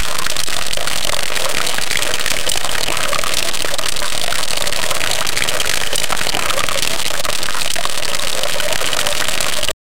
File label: a sound of thousands sque, juice